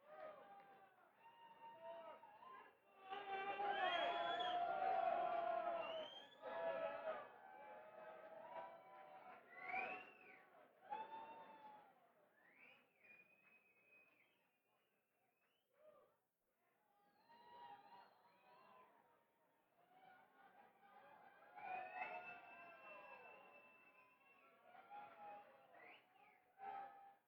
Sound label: Banter Crowd